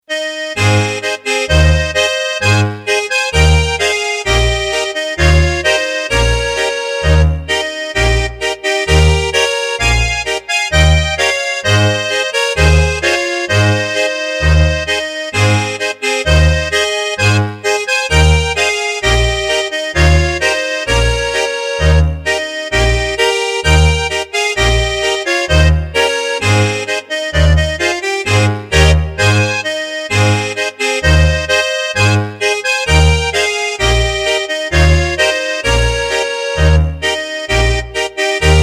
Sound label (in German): Rabeflue Polka